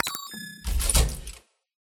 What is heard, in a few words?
keypad unlock